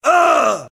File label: Scream